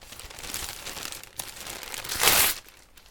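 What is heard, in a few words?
tear news paper; 新聞紙破る２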